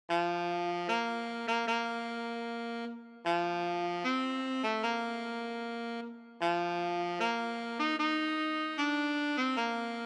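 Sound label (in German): solo Hochzeitsmarsch Altsaxophon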